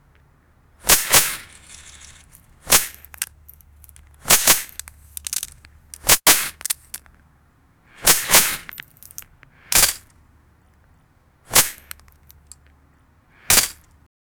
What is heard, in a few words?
fire arrow, anime; sukuna